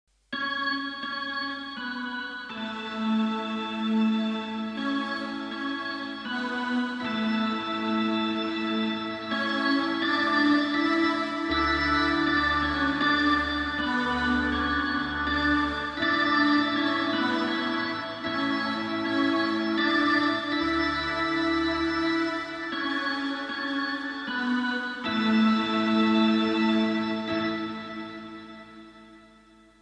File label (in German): orgel